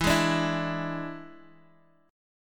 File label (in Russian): Boj E7